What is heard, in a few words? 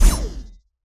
close combat hit medium; CrossCode; battle